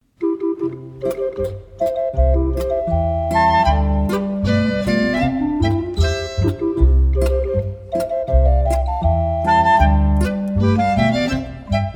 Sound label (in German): polkerl